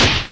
projectile exploding

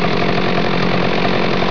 chainsaw idle

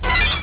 bip; stargate2

